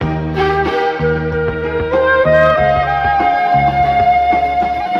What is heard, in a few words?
flute wrongdoing, Loops, Jazzmatazz